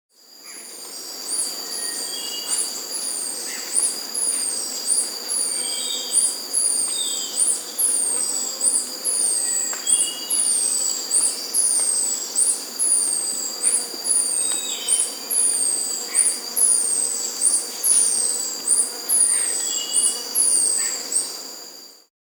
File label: Olive-Striped Flycatcher (Mionectes Olivaceus), Andean Birds; S. America